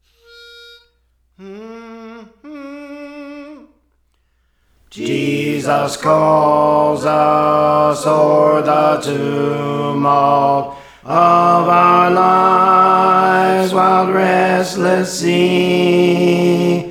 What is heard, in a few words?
Alto; Praise For The Lord Training; Jesus Calls Us